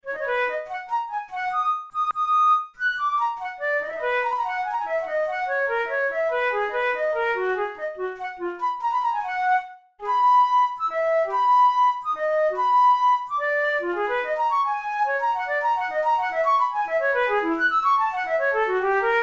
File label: studies for flute solo; karg-elert